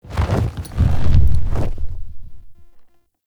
Horse Glide Wing Flap Ambient; Warframe; Duviri Horse; Vehicles

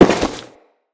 Crewman Step Default, Footsteps; Enemies; Corpus

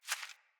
Hare Footstep; Foley

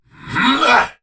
Attack Effort

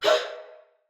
Stun, Male, en; Battle; Barks; Dialog; Sinecure Banneret

